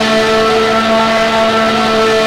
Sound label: ex PHIGH; Italy; AOS F1 2022